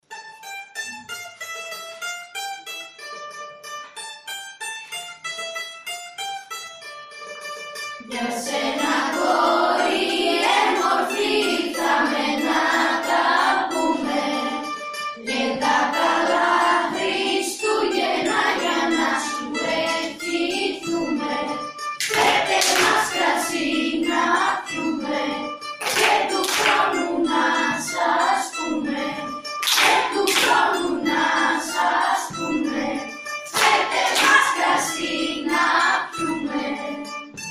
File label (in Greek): καλαντα ικαριας χριστουγεννων